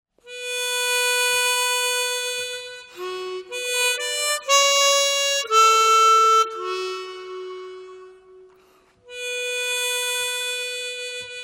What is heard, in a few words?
Acoustic